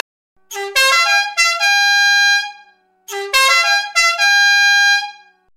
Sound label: charge